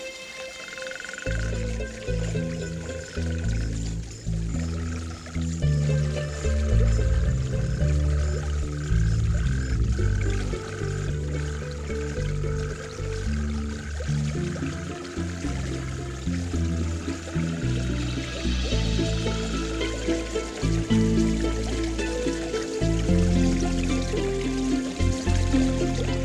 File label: Rippling Backyard Stream; Nature Sounds - With Music